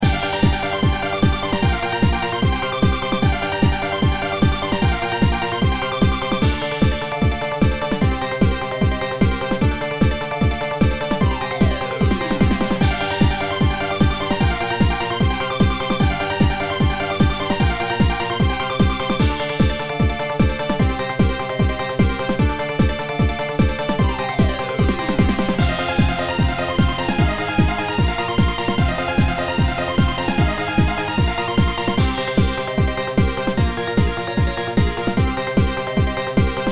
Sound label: Cute Dance; bomberman land wii gamerip 2008